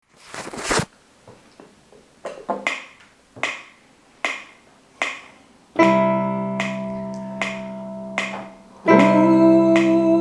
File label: sopran